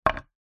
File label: foley small stone set down on wood